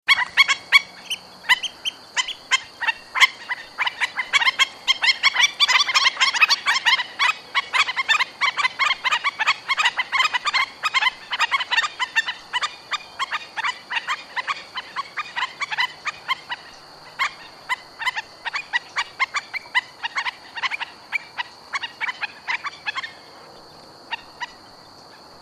tero real, Himantopus melanurus, Black- necked Stilt; cantos; Recurvirostridae

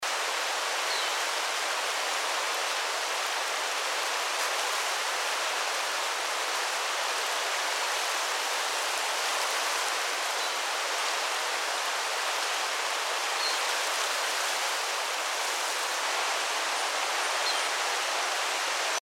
pato torrente, Merganetta armata, Torrent Duck; cantos; Pato de Torrente

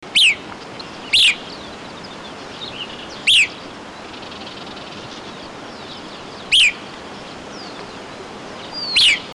Fiofío Silbón, Elaenia albiceps, White-crested Elaenia; cantos

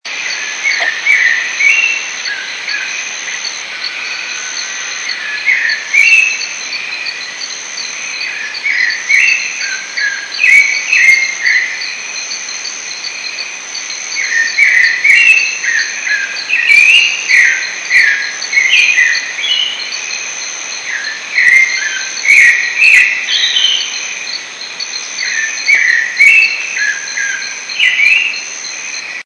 zorzal sabia, aves

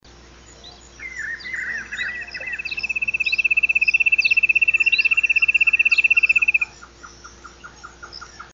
Inambú Común, Nothura maculosa, Spotted Nothura; cantos; TINAMIFORMES; Tinamidae

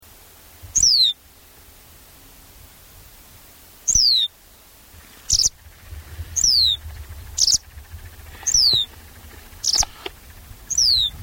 cachirla palida, aves

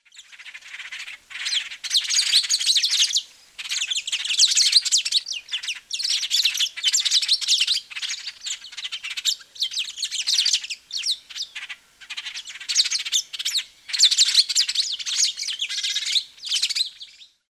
pechoamacomun

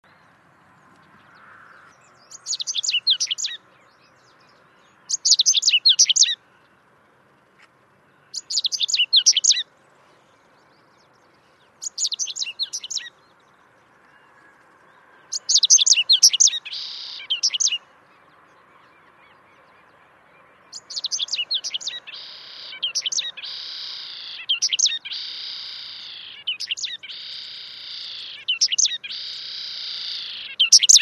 cachirla común, cantos, aves

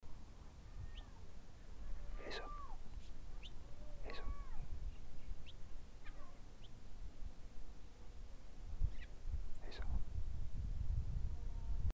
jilguero corona gris, Sicalis luteocephala, Citron-headed Yellow-Finch; cantos

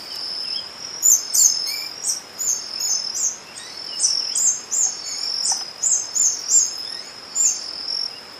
Ermitaño Escamado, Phaetornis eurynome, Scale-Throated Hermit; cantos

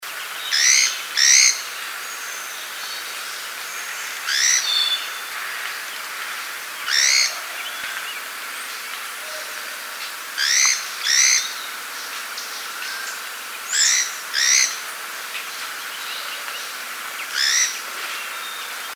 mosquecorogris; cantos

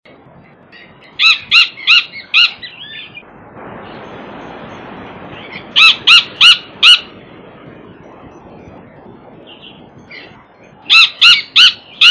gallito, Rhinocrypta lanceolata, Crested Gallito; Gallito Copetón; Rhinocryptidae

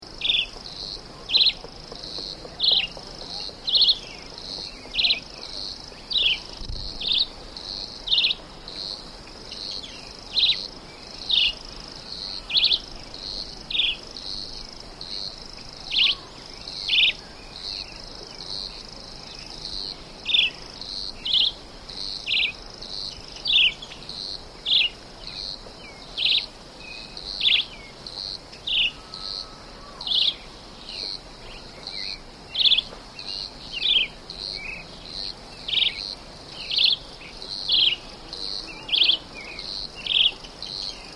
chiví común, cantos, aves

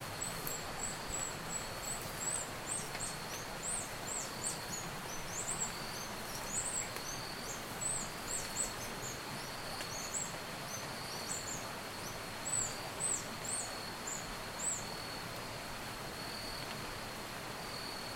Ermitaño Rojizo, Phaethornis ruber, Reddish Hermit; cantos

